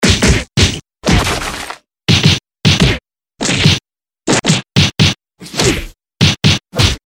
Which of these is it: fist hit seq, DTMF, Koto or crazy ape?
fist hit seq